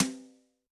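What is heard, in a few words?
Brush Rim, snare; Yamaha DTXpress-II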